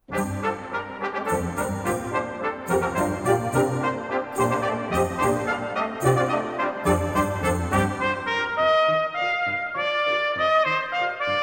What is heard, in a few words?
Trumpet Concerto; Ross; Mvmt3